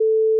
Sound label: tone